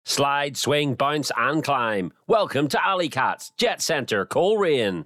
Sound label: slide swing